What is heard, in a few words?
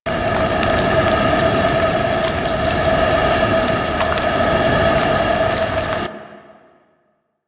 Infested Pistol Fire Loop; Warframe